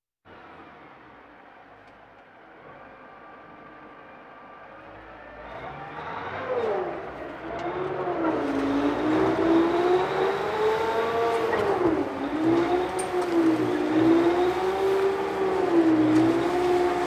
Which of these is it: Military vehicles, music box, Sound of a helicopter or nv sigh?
Military vehicles